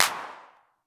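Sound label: clap, perc synth; Wizoo - Platinum 24 Electronic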